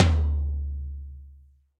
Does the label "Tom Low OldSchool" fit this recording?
Yes